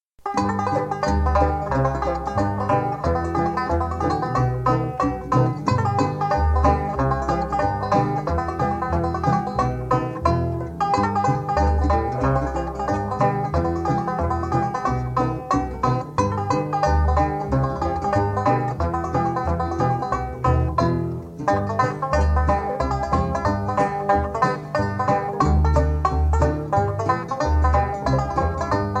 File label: Jig